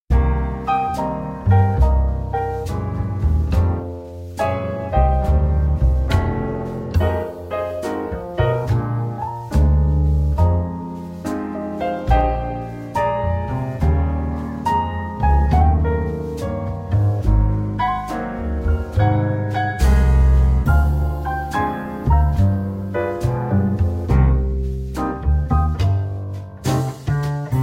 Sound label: Relaxation Jazz, Fusion